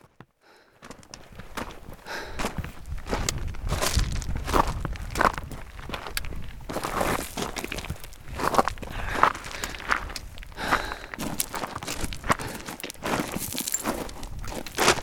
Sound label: Pass 5417m; Tonongh